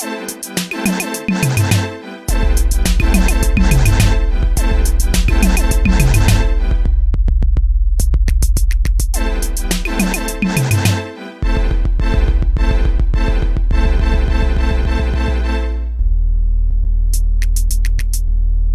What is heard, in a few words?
kicker bass attack